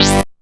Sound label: Synth Hit G